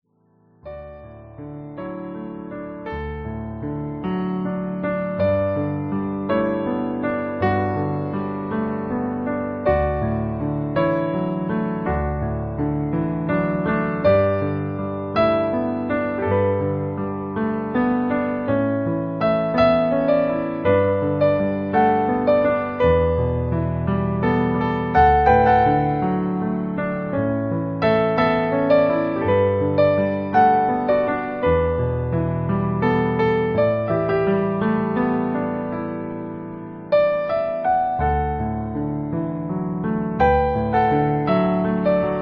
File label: Piano Cover